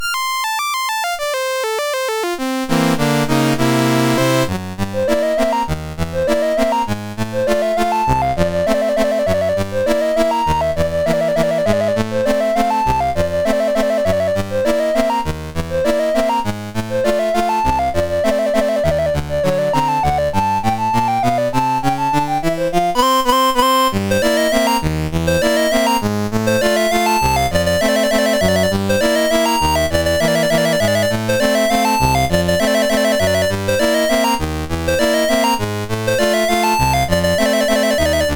Rag, MOS6581R4; Dave Marquis

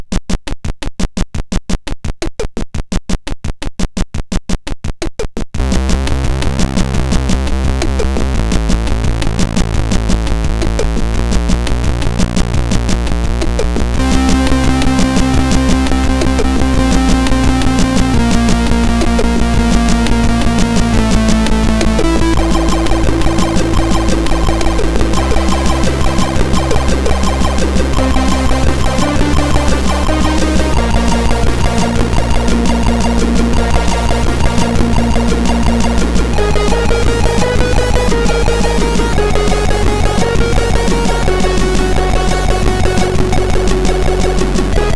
Intro, MOS6581R2

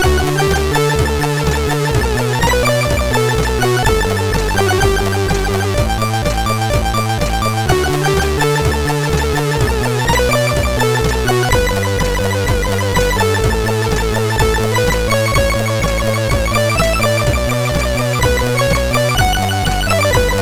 Cat Rap, MOS6581R3; sid

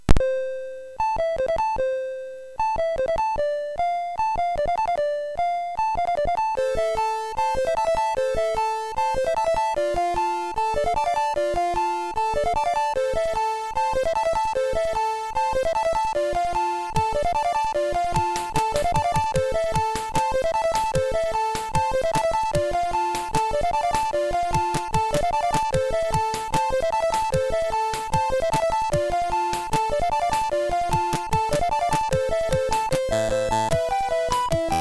Acid Bells, sid MOS6581R3; hvsc

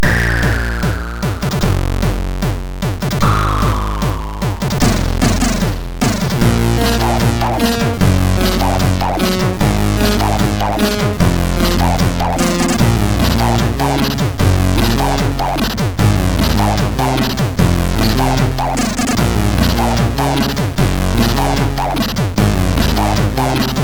intro, MOS6581R3 sid

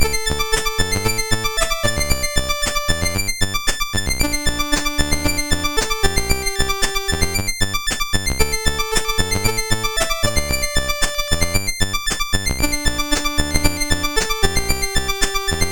Juke Box, MOS 6581 R4

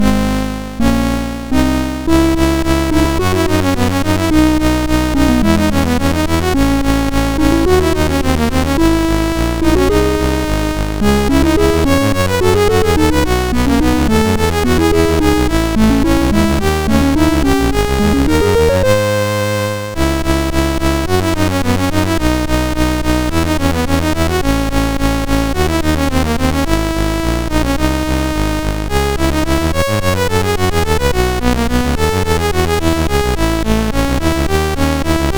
GoatTracker Classical Example sid MOS6581R2

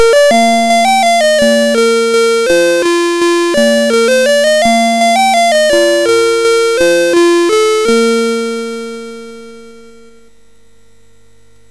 sid MOS6581R3, GAMES; Alice In Wonderland

